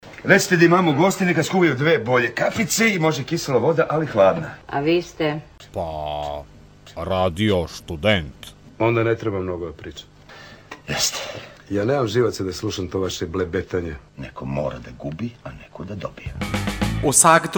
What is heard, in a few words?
JINGLE